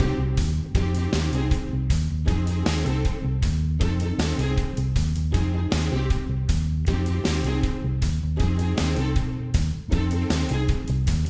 City Platforming MIXED; ben 10

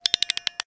Creepy Music Box; The Doll Recharge

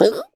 vault vocal; Scrat

